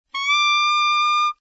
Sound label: E7 alt, saxophone tenor